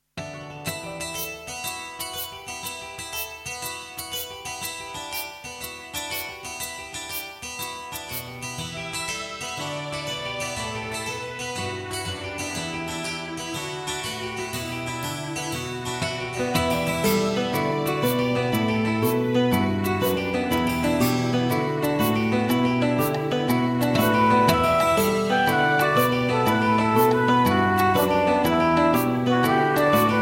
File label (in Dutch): fusion, Op bezoek bij een kinderboerderij; AG fusion